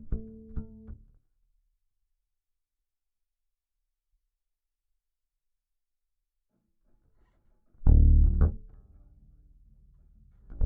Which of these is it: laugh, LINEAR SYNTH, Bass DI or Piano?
Bass DI